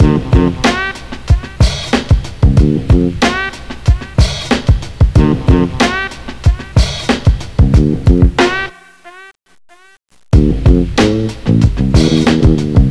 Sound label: funk four